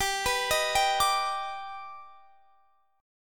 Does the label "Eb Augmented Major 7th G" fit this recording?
Yes